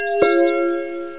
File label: Mailbox; FirstClass; VillageSettings 3.80